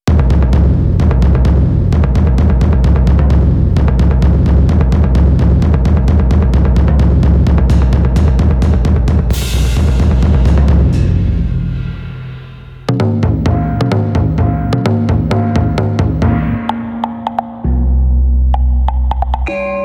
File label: china perc + gong